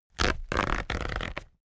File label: paper towel roll sheet tear off